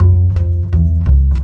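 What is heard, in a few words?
165 acoustic bass climbdown; acbass; MELODIC LOOPS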